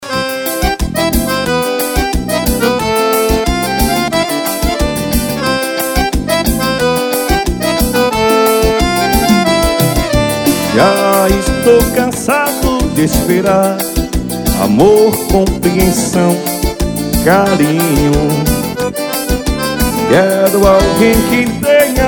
forró

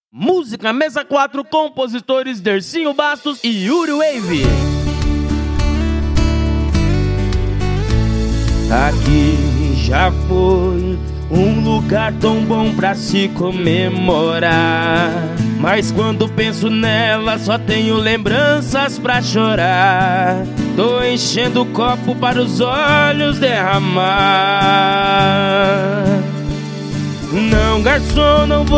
sertanejo sofrência

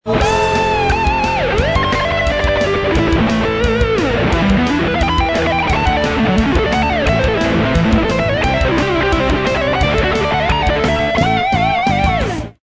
solo da música

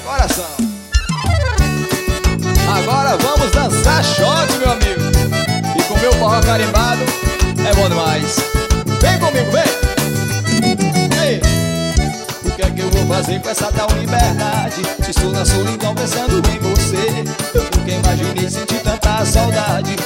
forro

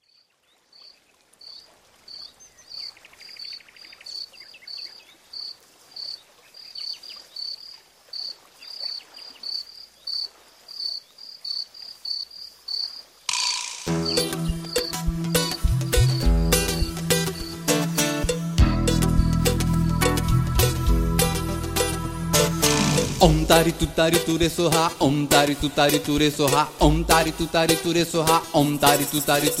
om tare tutare ture soha mantra tibetano

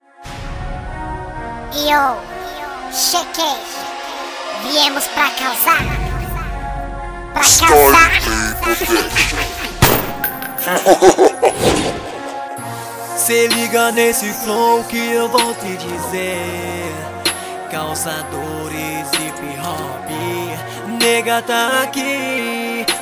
hip hop introducao

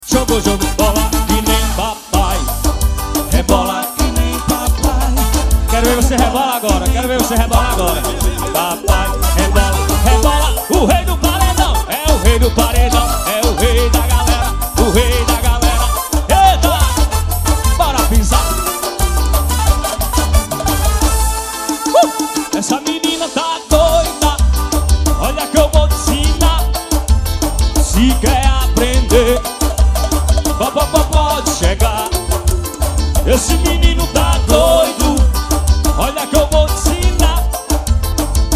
ao vivo forro

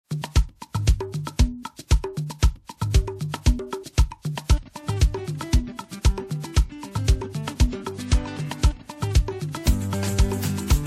meu violão nordestino

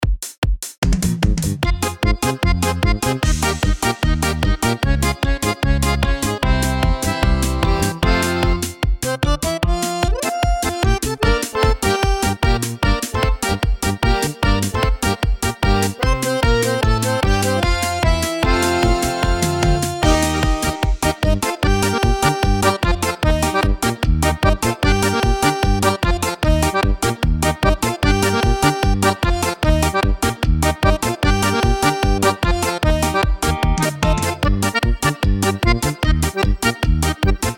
meu pet e show instrumental

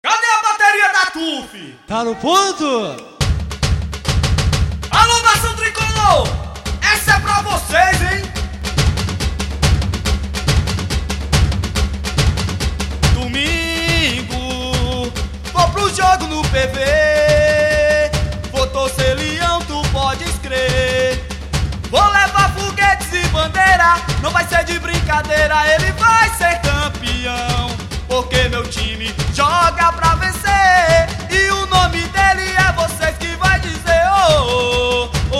samba

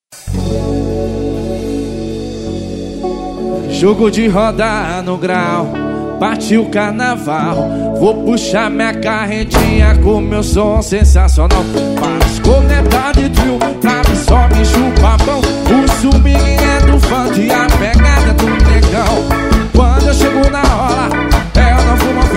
samba

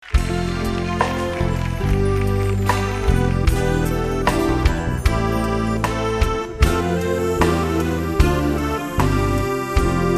toada

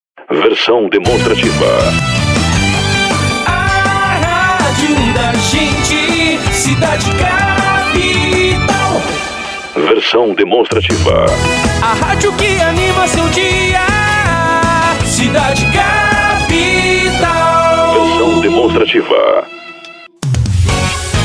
vinhetas cantadas

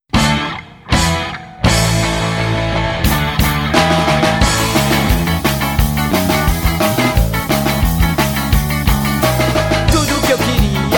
skarrado

